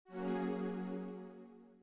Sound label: usb effect, ui; Infinix Hot 50 5G